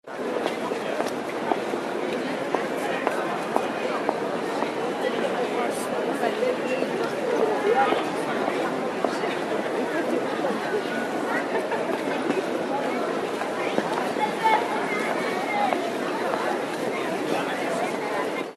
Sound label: pedestrian zone